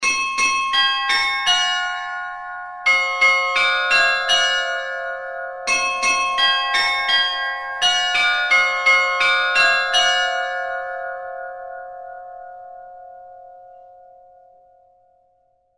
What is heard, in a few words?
bells melody